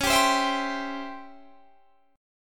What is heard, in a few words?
C sharp Minor Major 7th Flat 5th Guitar Standard; C#mM7b5; strummed; JGuitar